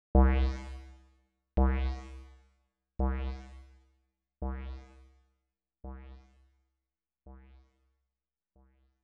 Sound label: Boing, Roland JU-06; sfx; SoundDiviner Project; Roland JU-06 Pack09; DigiD sfx pack